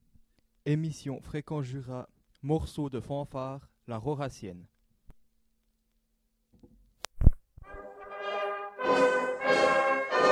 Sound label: La Rauracienne fanfare